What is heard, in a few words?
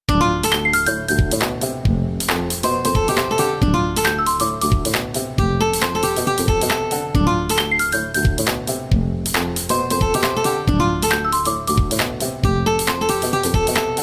Htc Ringtone